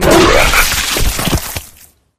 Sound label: flesh destroyed large; flood